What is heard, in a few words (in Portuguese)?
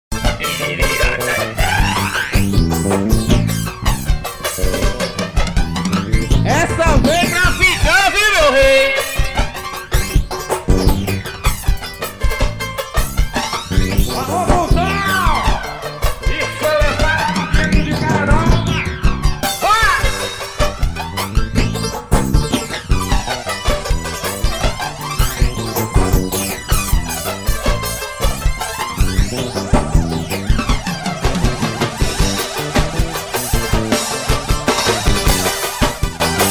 abertura